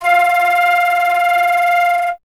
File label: FLT FLTF, Flute; Miroslav Vitous - Solo Instruments VOL-2; Solo lnst. II